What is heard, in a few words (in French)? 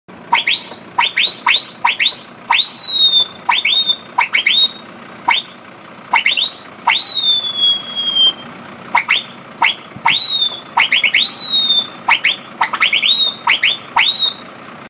sifflet turc camion